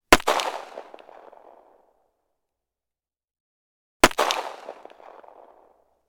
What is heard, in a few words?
gun 1911; weapon; still north media